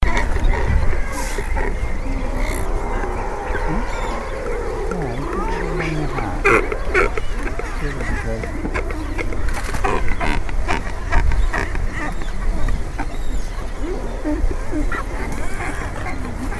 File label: European Shag